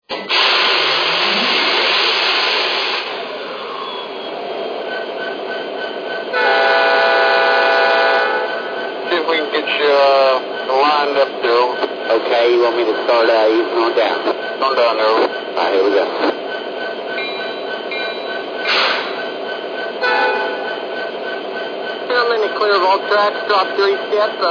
sd70ace, trains

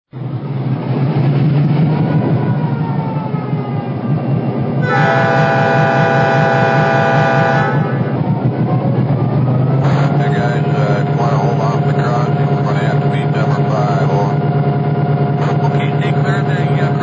SD24

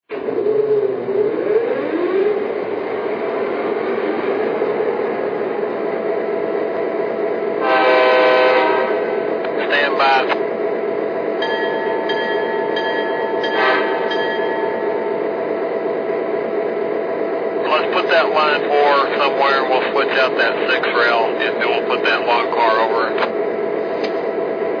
mp15; ny

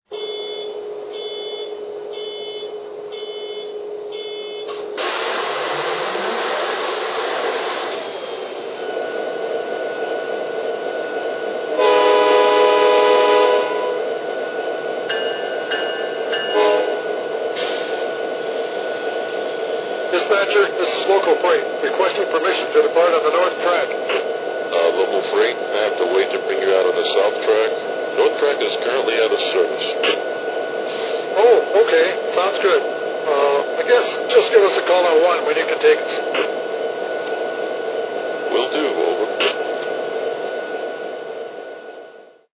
SD70, trains

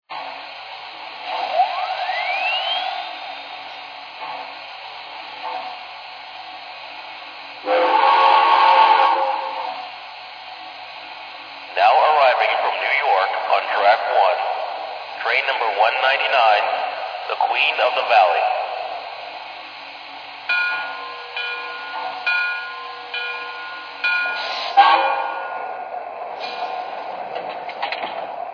p47

